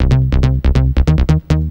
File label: Bass; Techno Music Maker